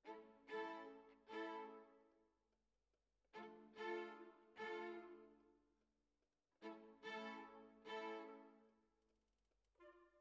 Violins, jazz for piano

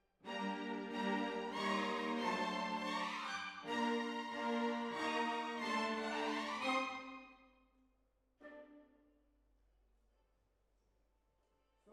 Hall

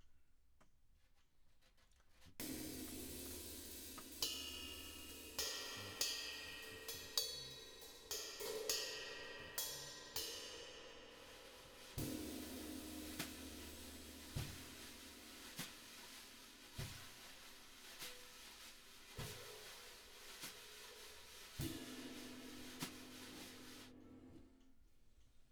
Drums

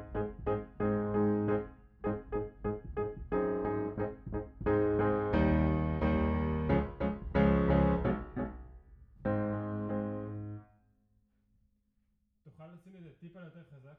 Piano Mono

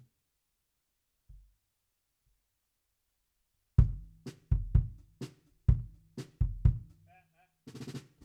BD

